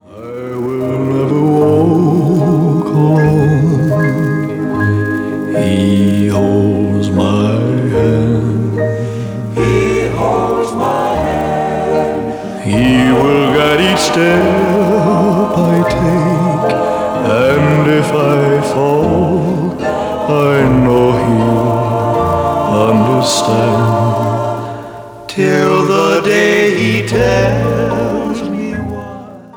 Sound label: rock